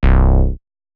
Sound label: SYNTH BASS; 808s